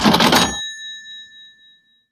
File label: Coins Obtained; Chiptune; Wii; Super Smash Bros Brawl